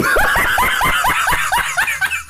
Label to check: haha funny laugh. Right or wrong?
Right